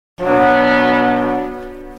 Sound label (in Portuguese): navio